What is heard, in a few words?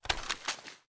reload empty raise; reloads; gmod; iw8